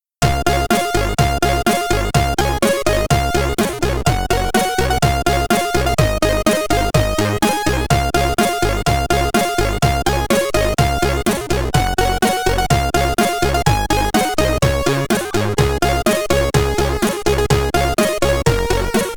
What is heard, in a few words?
Jingle Bells 6581